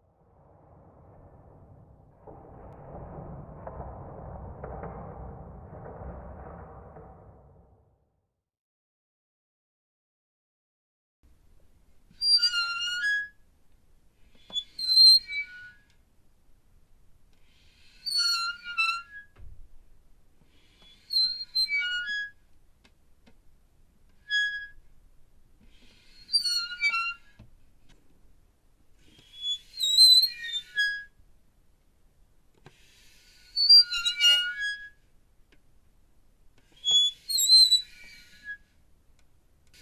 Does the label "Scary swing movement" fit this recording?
Yes